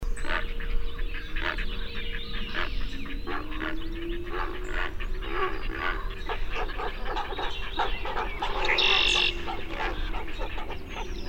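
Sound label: Heron Great Blue Growls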